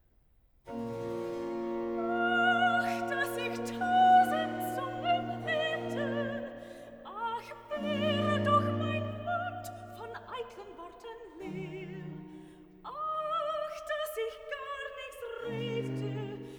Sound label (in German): Recitativo (Sopran); vocal; soprano; BWV69a; Ach, dass ich tausend Zungen hätte!; BACH